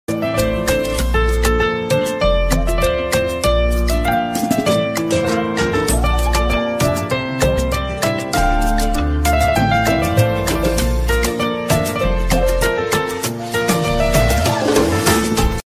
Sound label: instrumental ringtone bgm tone; soundfizz